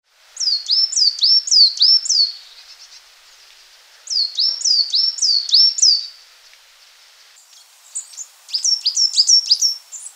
zwarte mees, vogelgeluiden; hdykstra

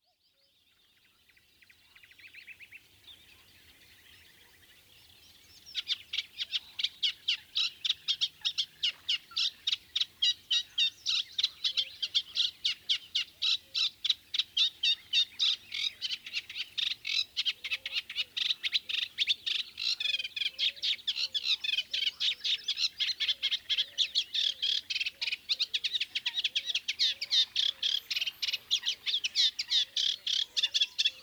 Kleine karekiet, vogelgeluiden

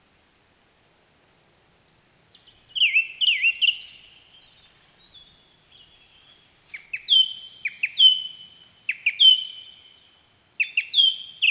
Zanglijster, vogelgeluiden

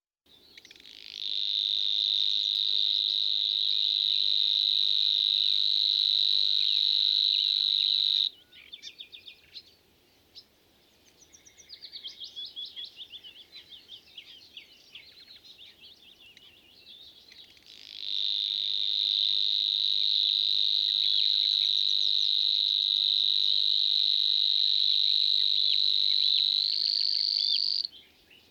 Snor, vogelgeluiden

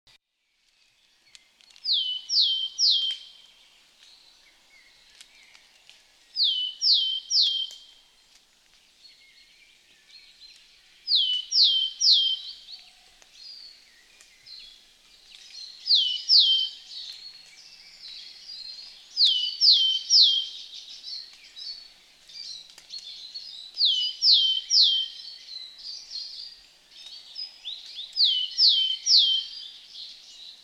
Matkop, vogelgeluiden

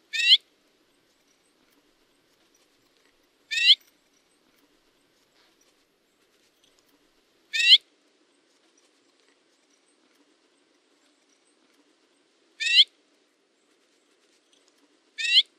kleine barmsijs; Zang en andere geluiden van in Meijendel broedende soorten; hdykstra

